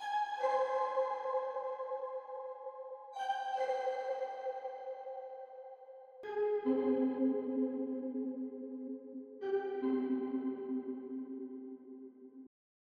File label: 77 BPM C Min Pluck; Cymatics; 2022 Melody USB Expansion; Origin